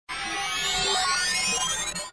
console; cheesy atlantis; follow That Turtle; space Station Surprises

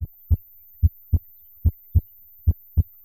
lose; walkingDread

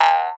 enter hole, wombat; cheesy-outback-adventure

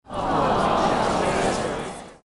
game over; my Little Pony

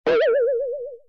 spinout; cheesy-themepark; superSlideSplash

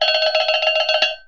pickup; earth-to-echo; downhill

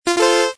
convert; nerf; vortexPyragon

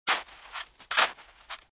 dig; cheesy-underwater-adventure; canYouDigIt

